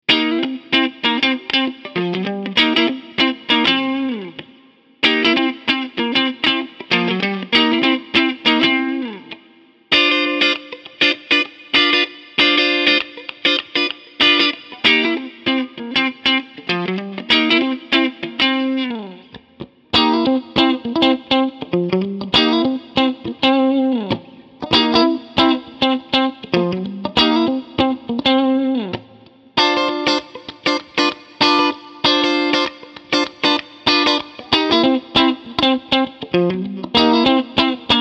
Engl Fireball 25 Clean Singlecoil

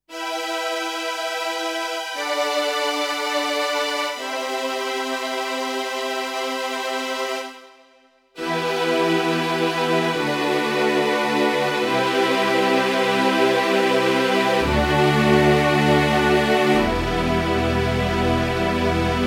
CP40 Strings

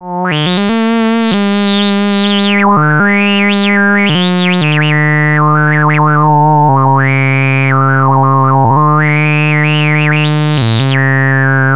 ARP Avatar Vibrato via AFT Cutoff via WHEEL

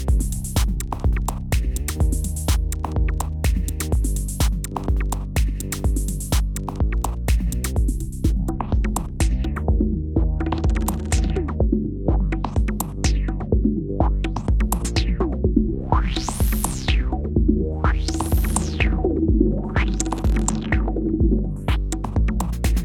filter

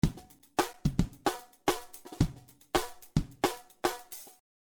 Drums Pitch

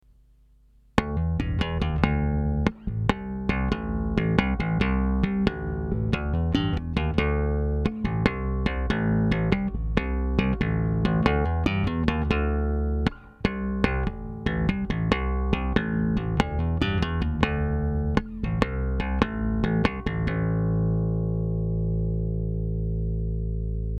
digi amp

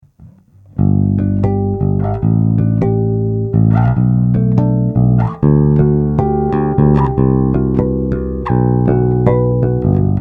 Sweeper2 Harmonics

